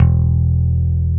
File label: BAS; Best Service Gigapack 2